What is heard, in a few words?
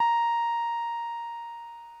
ebm, Omnichord; OmnichordGodot